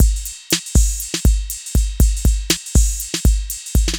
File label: Synth Loop, BPM120